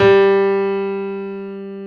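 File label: G2 piano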